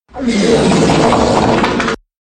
fart meme reloaded, Wet Fart

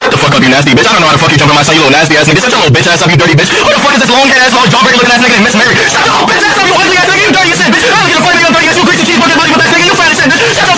RAP

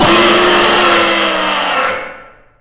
jumpscare sound; FNAF; birthday boy blam

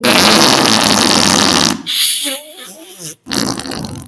FARTS

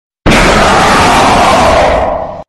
jumpscare; three nights of amongus houses 3

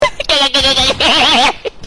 GOOFY LAUGH; memes

